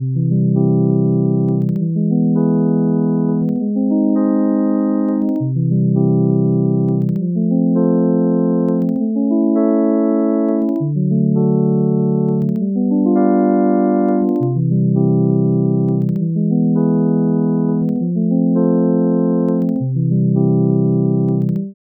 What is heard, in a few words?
twelve tet progression